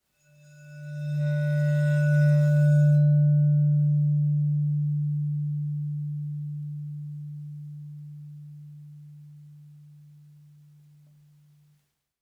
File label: Vibraphone bow D3 stereo; MIS Pitches - 2014; Percussion